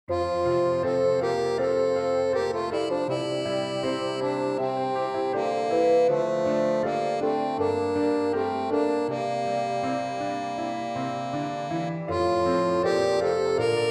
Basson; mini suite

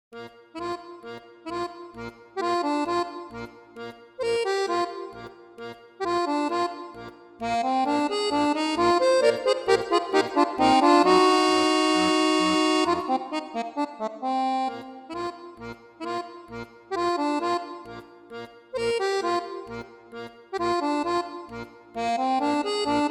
Solo; mini suite

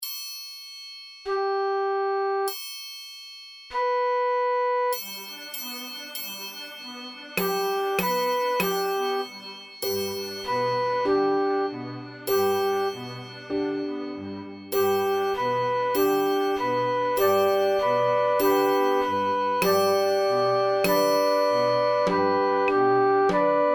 Suonan le campane, flauto dolce; impariamo divertendoci col flauto dolce

